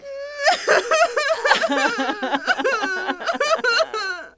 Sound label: Human Female Cry Group Cry